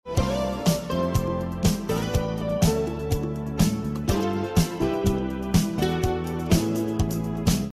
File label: Ringtone Music Cartoon